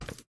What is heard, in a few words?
Pocket Flashlight; Lethal Company